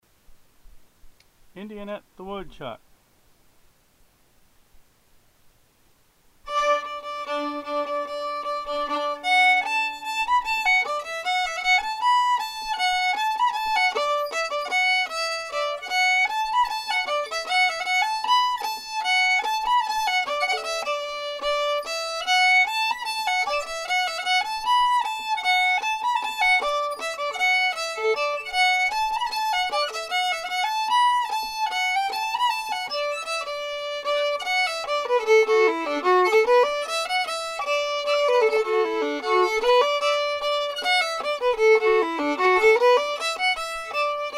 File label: fiddle; festival tunes